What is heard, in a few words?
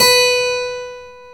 KEY HRPCR, Roland; Roland - LA Composer vol. 1